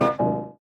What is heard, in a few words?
Warning HUD